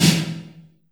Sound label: LR S808, TR808